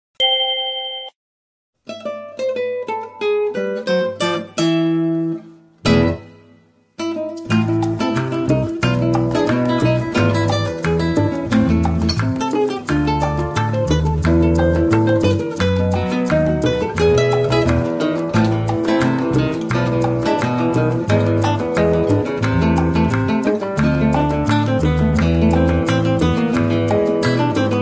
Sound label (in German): band; Rhythmische Sportgymnastik; Level A AK10 Band Musik; Kampfrichter*innen